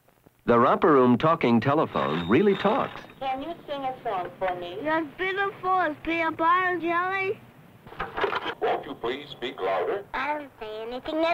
phone; romper room

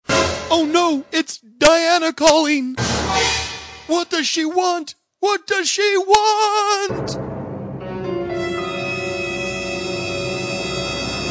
Suspense

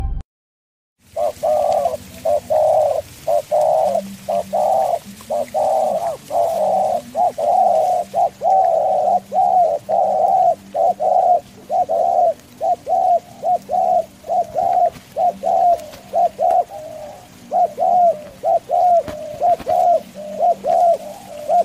Spilopelia chinensis

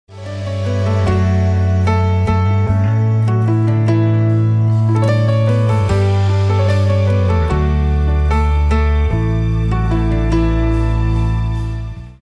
piano part only

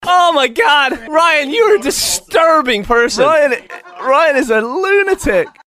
RNB

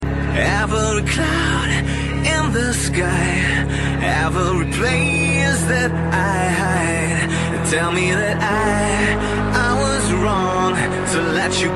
jazz

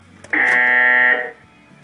Fail Button

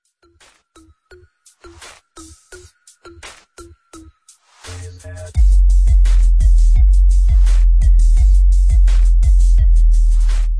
bass boosted

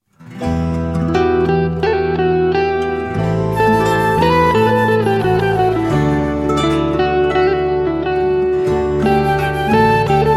turkish

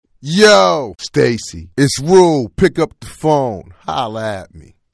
Inspirational

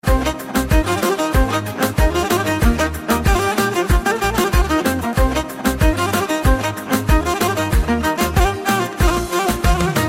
manea violin, ringtones

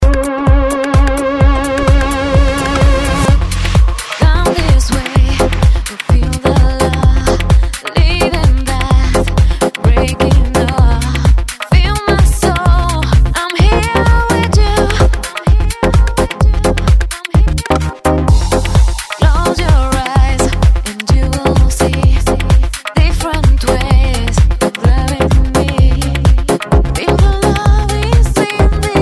Deep House Mix

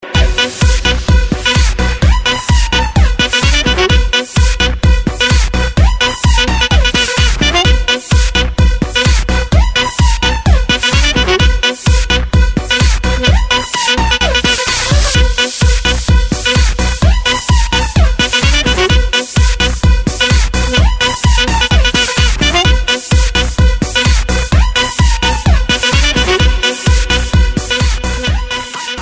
electro reggaeton

